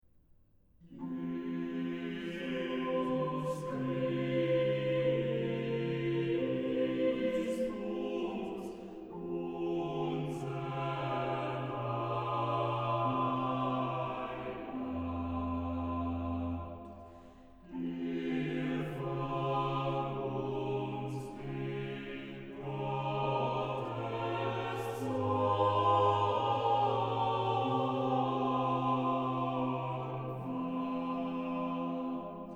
Chorale, organ; BWV0363; Jesus Christus, unser Heiland; BACH; BACH ORGAN WORKS; CHORALE PRELUDES

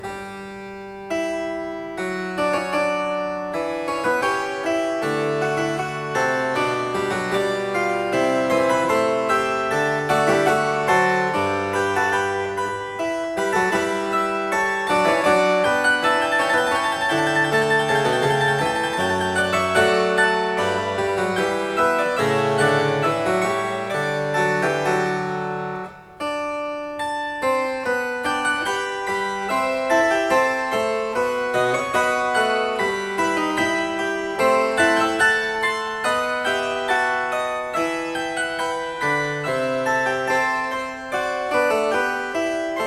Cembalo; Variatio 22 a 1 Clav Alla breve; Bach; Goldberg Variations

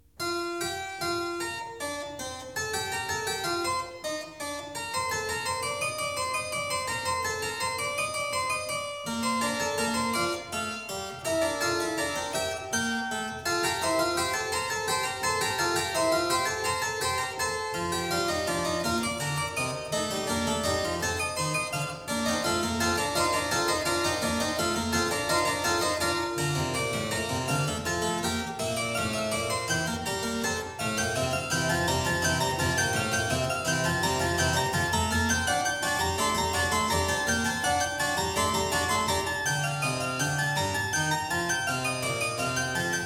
Fugue in B-flat major, clavier; Prelude & Fugue No.21; BWV 866; BACH; WELL TEMPERED CLAVIER; BOOK I

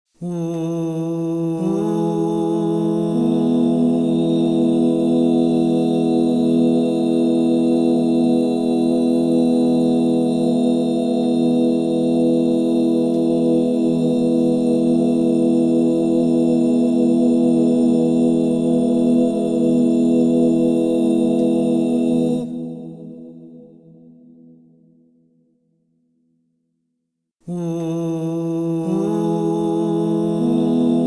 Mi major, crystal bowls; Yoga and sound

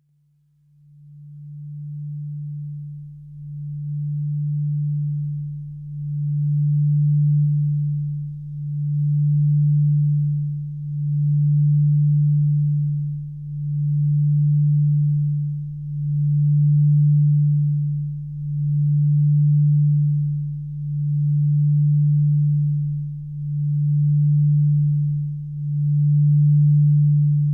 singing bowls; Yoga and sound

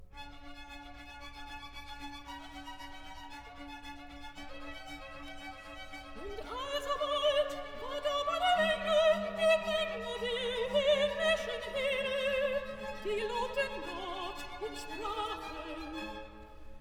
Recitatif accompagne (Soprane II); HANDEL; Messiah

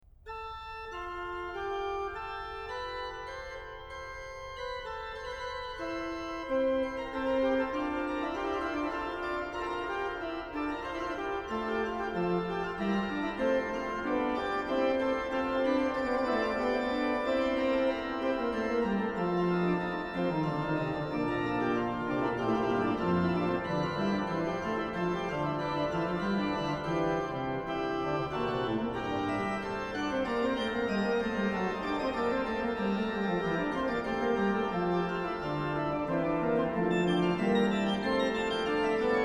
Fughetta, organ; BWV0704 Fughetta sopra Lob sei dem allmдchtigen Gott; BACH; BACH ORGAN WORKS; CHORALE PRELUDES

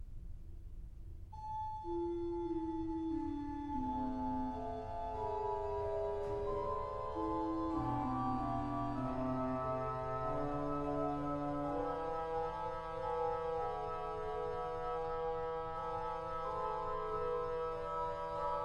Auf meinen lieben Gott, BACH ORGAN; Chorale preludes; Orgelbüchlein

